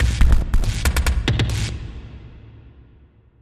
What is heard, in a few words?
Glitchy Sting